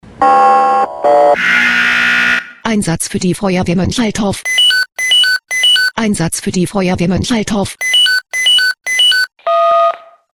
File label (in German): SMS Alarmierungstone; FW Moenchaltorf